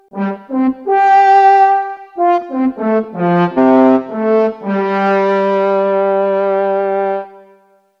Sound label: Classical French Horn Bells